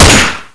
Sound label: uzi fire; weapons